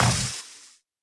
Bio Plasma Hit

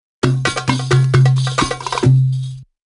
Drum; Shaman

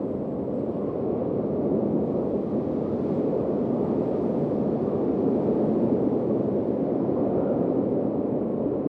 weather wind loop; adams venture origins

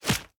Gunfire Hit; SFX; The Town

